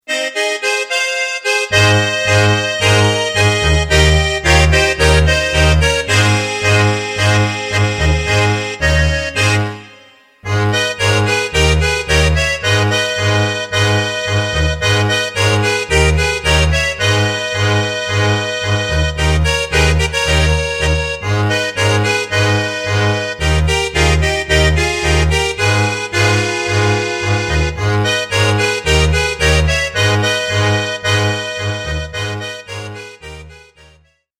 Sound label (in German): Gitarrenserenade; TAO002: Tango Band 2